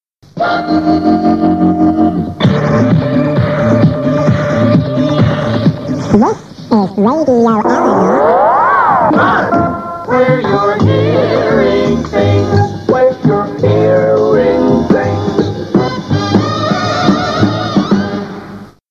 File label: Jingles